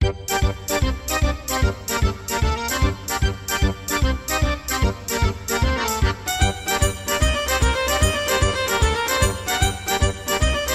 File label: Tarantella